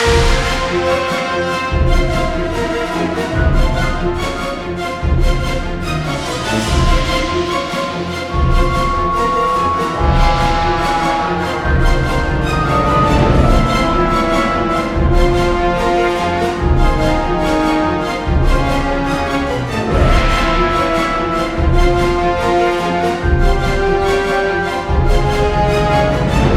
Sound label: music boss